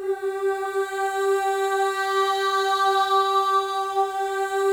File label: MOVING V3, Symphony of Voices; Spectrasonics